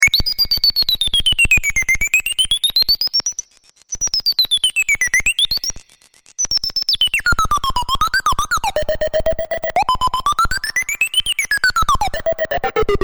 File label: Space Bleeps, Futuristic space signals.; Communications